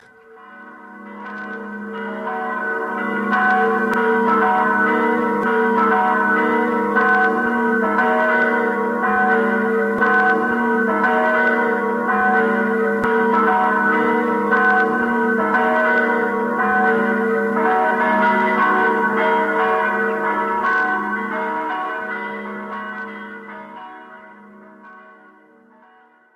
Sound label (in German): Glocken; Marienburger